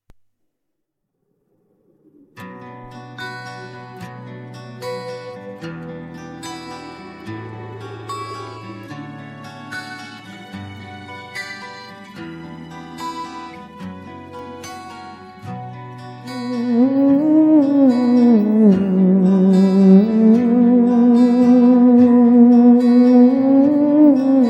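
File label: theremin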